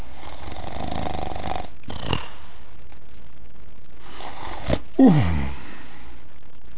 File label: owl sleeping